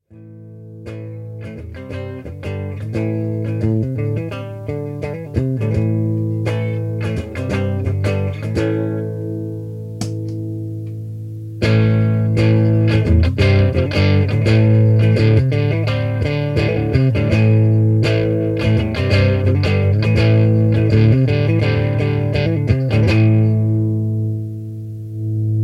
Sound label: Tube Boost Drive; circuit demos